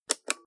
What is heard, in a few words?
switch